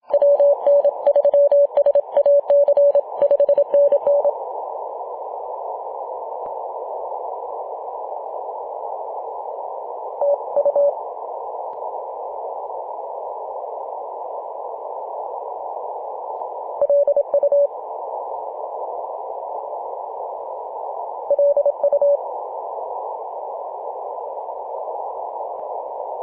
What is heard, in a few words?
18.078MHz CW; JA0RUG